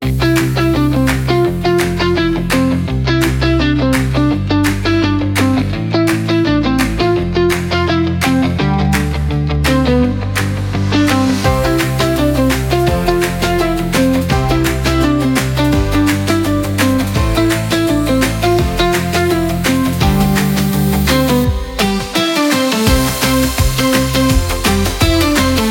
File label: 84 bpm Happy Melody Guitar Handclaps; 53 sec; ai social media music